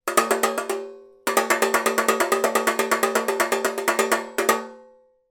impacts metal pan object swing impact inside multiple bell like